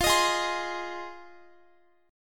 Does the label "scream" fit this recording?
No